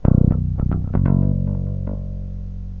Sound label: idealized baby bass guitar